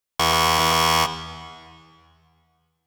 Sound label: basketball buzzer